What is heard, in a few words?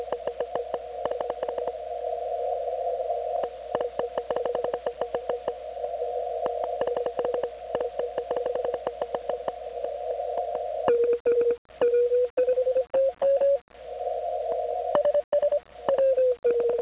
CW Thump, IC-756PRO